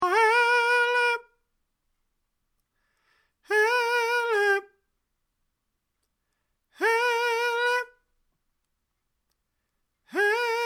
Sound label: soprano